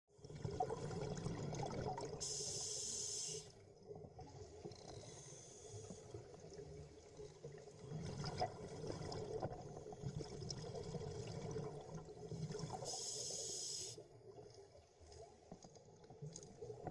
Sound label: scuba diving sfx